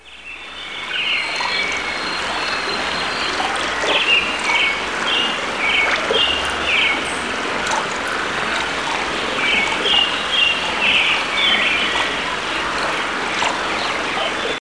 Brooks birds; SuperModels in the Rainforest

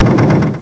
damage; aggress

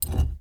transition

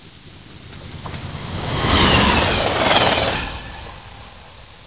Underground Sign Train Pass

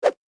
generic spear; Immortal

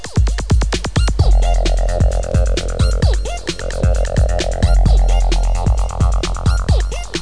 electro

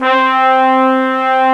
brass; Descent

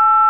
TONE; webphone

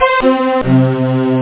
viola string; OctaMED SoundStudio V1; strings

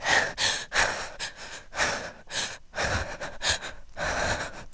running breath; urbanchaos

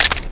shutter; My Secret Beach

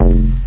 LFO-BASS; PhatTrax

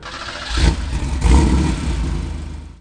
Start Engine; Ruckus

